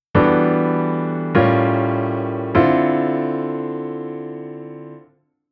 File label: Chord Tension IIm7